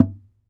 SAZ TAP; Spectrasonics; Hans Zimmer Guitars VOL-2

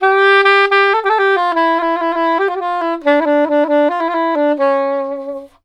SAX, Balkanish Traditions

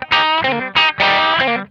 Funk Guitar; Zero G - Funk Guitar

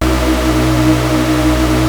Prophet 5, B2; Hollywood Edge - Giorgio Moroder Rare Synthesizer Collection

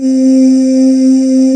VOICES C4, Choir; USB Soundscan vol.28 - Choir Acoustic & Synth; AKAI

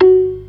PERC B 3; B3 FULL

